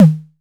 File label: tom deep; Crypton - DrumBase5000